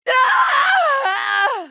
k o scream; mk9; kitana

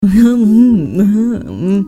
hum mm hum

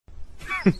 women hahaha; team fortress

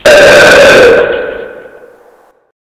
burp

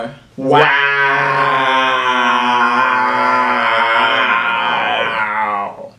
wooooooow

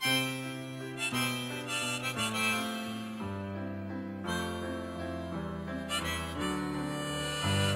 piano

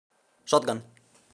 shotgun

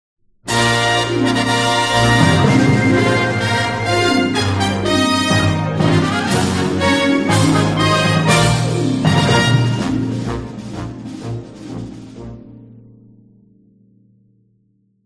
grand star get; super mario galaxy

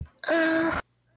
moan

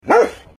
wooooof

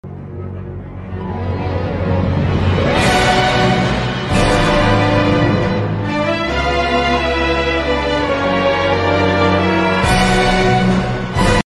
orchestral

